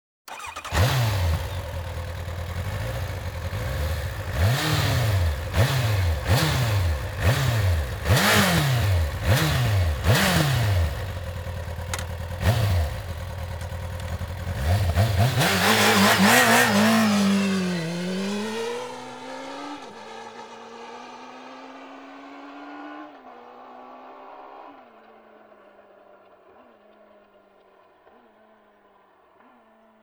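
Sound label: Kawasaki ZX-9R, motorcycle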